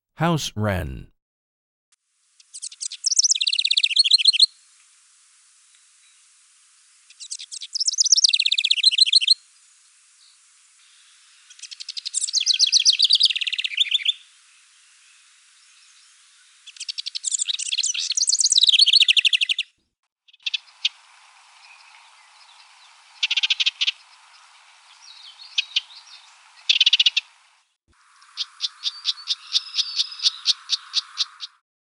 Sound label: House Wren; Non-Game Birds